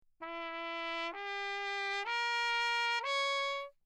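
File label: trumpet mute Bach; JoRal; Perinétventiltrompete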